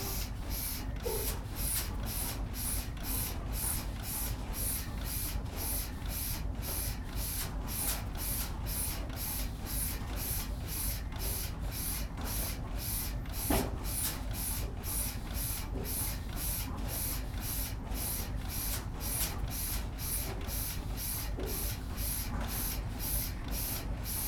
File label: gas engine